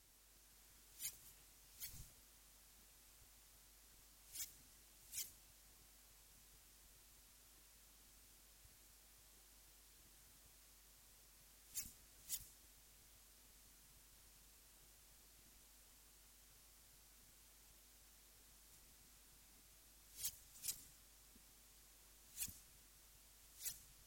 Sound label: peacock